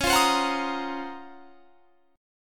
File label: Db Major 13th; strummed